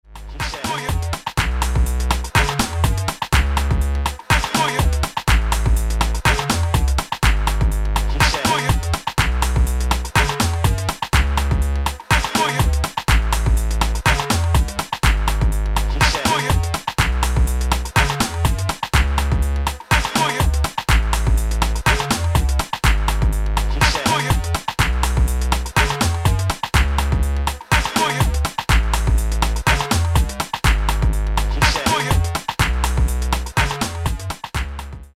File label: funky